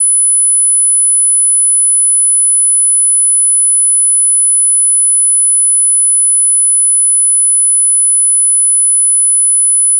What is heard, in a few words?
test tone 10khz; speaker test